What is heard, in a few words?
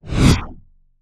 Swell Flanged; bottlerocket